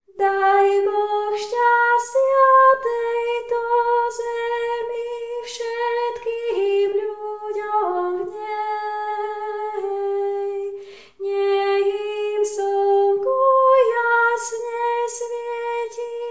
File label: tenor, spevokol; Daj Boh stastia